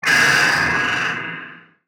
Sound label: attack; Barotrauma; Legacyfractalguardian